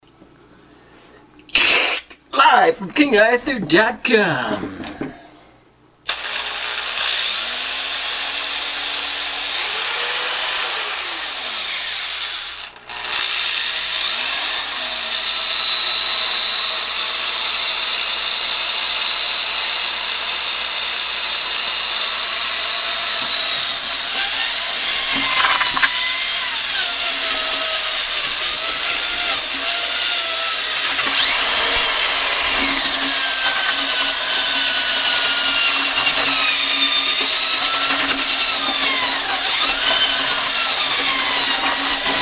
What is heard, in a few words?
Mixer Drill